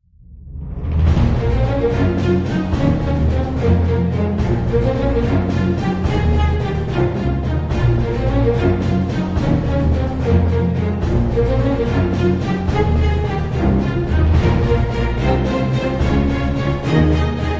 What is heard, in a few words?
bgm battle; squidGame